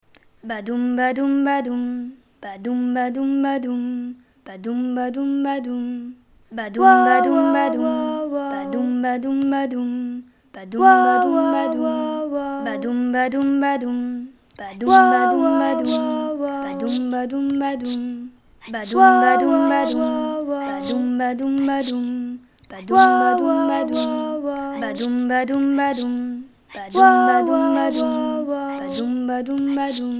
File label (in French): chant